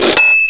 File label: CASHREG